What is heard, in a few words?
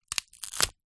impacts wood thin snap break